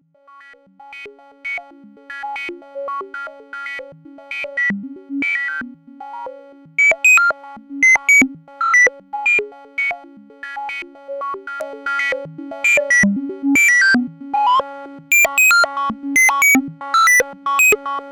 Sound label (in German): Blubber SE80